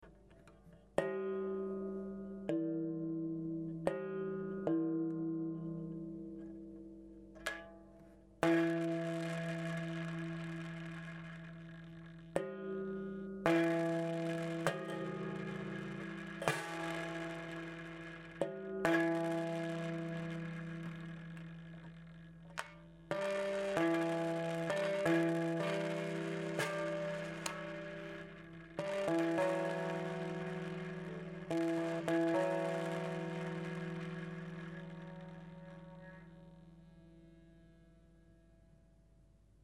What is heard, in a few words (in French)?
pince, table, harpe